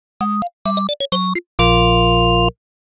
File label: Death; Férias Frustradas do Pica-Pau; Genesis